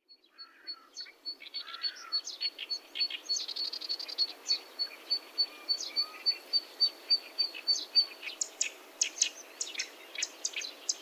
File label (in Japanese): セッカ さえずり